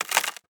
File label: wood crack short